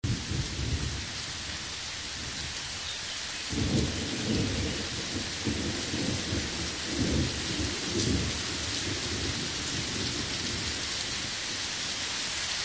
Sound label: First Rain, Moderately Strong; RAIN, THUNDERSTORMS; Sleep Sounds of Nature